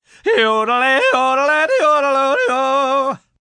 Yodel Upbeat, Yodelling, male, sung, upbeat, funny, comedic, cartoon (human voice); Funny Sounds; VoiceBox